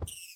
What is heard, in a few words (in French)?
Lapin minecraft mort